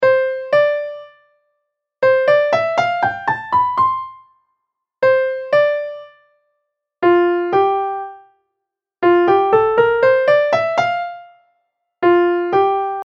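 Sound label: major 2nd Scale Example; Interval Ear Training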